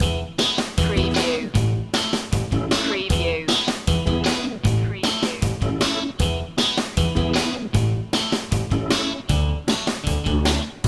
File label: pop loop